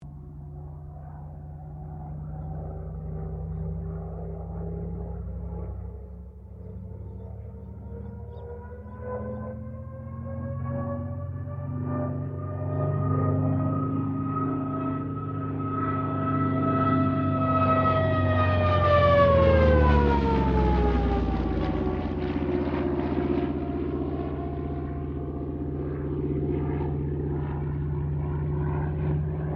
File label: AIRCRAFT CA-13 BOOMERANG LOOP; Airplane; Vehicles; Aircraft Collection